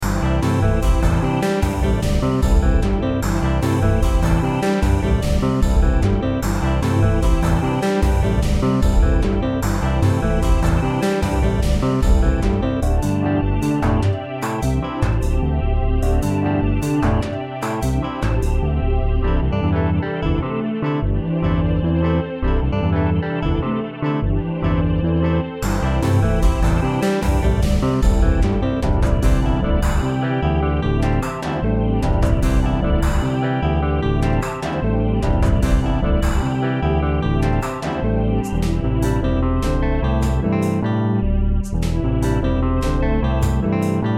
cool music

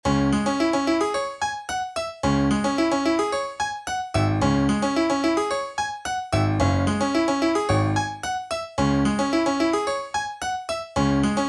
Spikey Piano

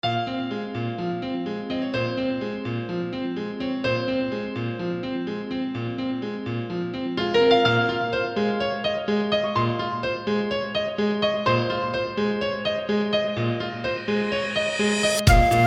cold wind, A music.

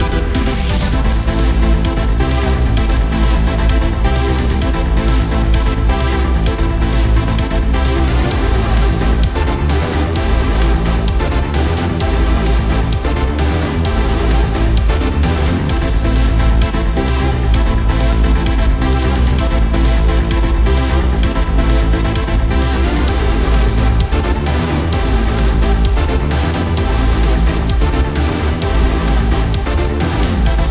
space battle bpm130

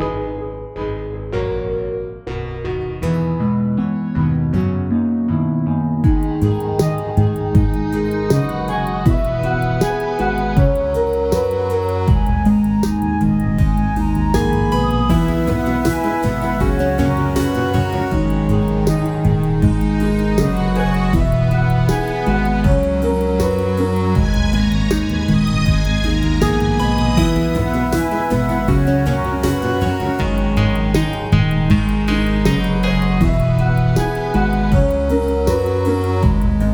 loop

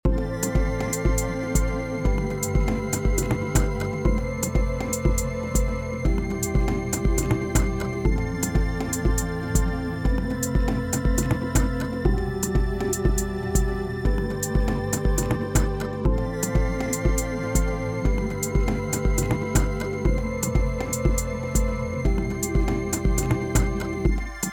outro

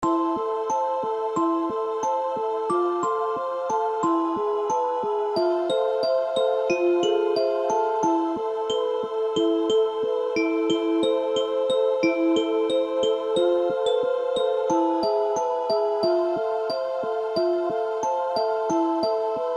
snow panic no steps

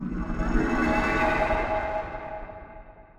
ability gravity pulse loop

